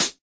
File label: hat; Hats